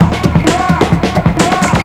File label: HEAVY 130, loop; 122-146 BPM